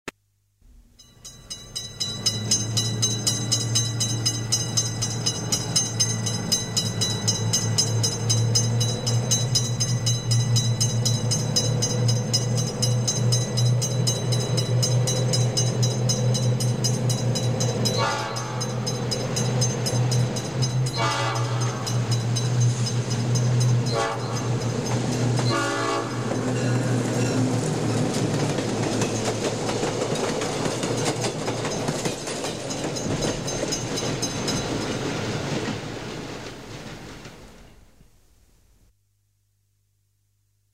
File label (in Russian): Звук сигнала о приближение поезда